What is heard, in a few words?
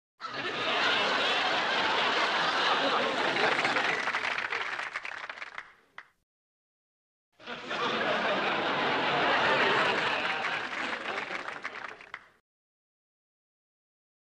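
Crowd 40 Medium Large Burst; small int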